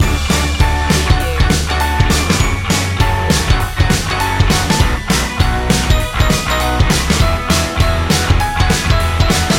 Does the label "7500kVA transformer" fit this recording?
No